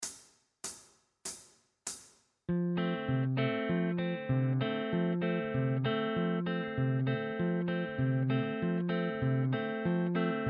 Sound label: Backing Track; bluegrass guitar